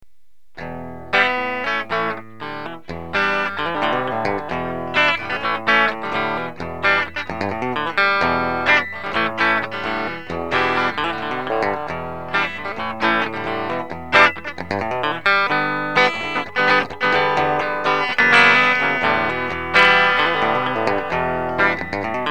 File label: bridge clean, guitars